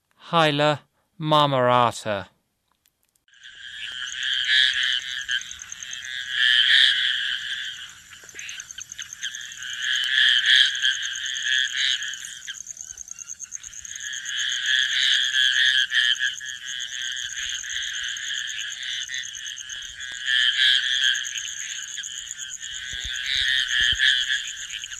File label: Hyla marmorata, Frogs of Ecuadorian Amazon; S. America